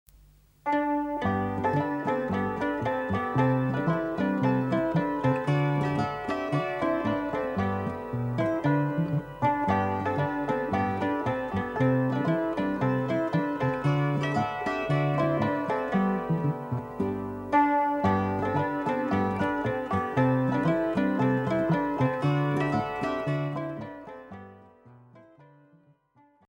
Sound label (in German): wasserfallpolka